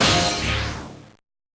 Ghost Mode

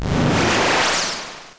1Up; Touhou 6

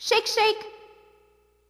Shake Shake

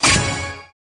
Player Buzz; The Chase

